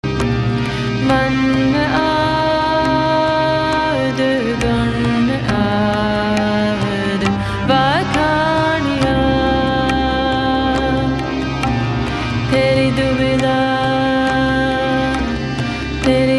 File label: trance